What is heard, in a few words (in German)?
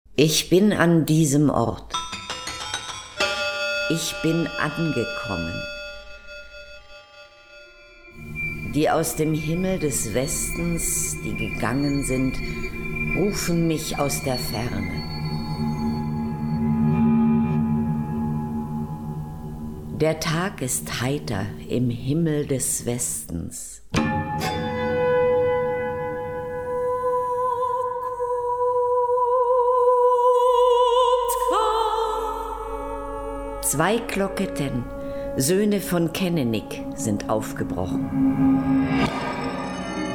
schamanin klanginstallation